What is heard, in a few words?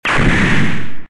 explosion; monkey2; PromptInvasion